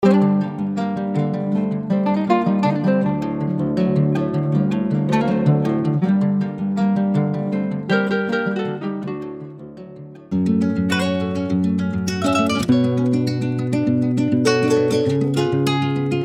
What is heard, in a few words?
Guitar; Guitar WRLD Vol.1; Sample Hub